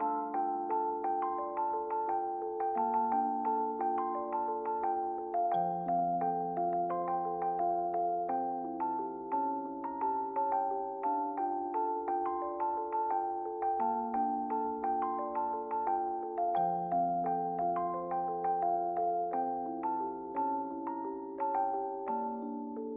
applause; o!mania Skadi Arrows

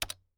pause continue hover; Le Fishe au Chocolat

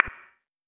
pause continue click

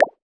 spinner bonus; Aleph DT

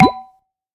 spinner bonus; Luciano NM

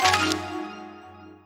menu back; Seoul v10

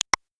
menuback; Default Reforged v1.1

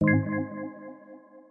menuhit; ⩽ seele ⩾ 【azer】

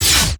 menu back; whatever skin azer was using on stream

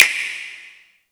drum hit normal; Zylice Skin Mix (2024)

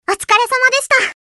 applause

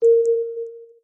menu hit

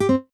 match notready

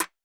soft hit finish; kodama - nogi; eri

